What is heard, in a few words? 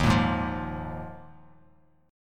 D sharp Suspended 2nd Suspended 4th D sharp Bass Standard; strummed; JGuitar